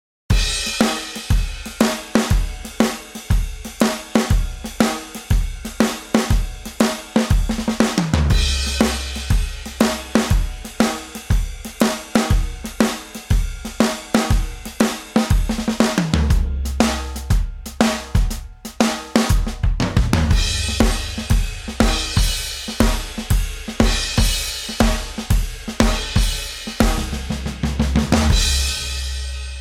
drum only

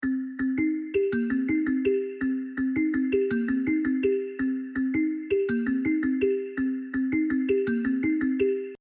kalimba

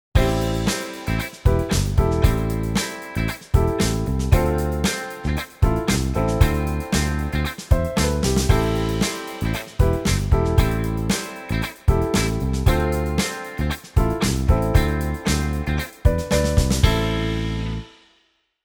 church mode; ex church mode

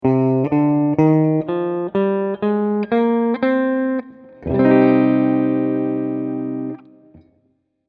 c minor

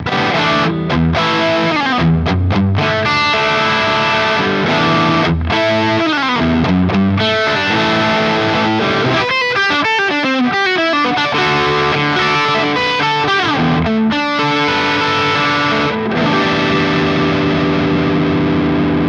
ownhammer blend

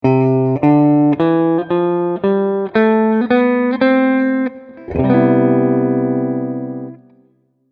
c major

